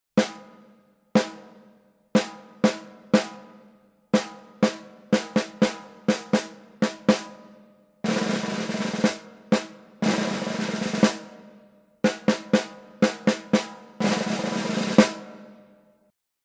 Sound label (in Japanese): 基準音0dB